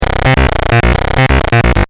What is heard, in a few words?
BASS; mazda7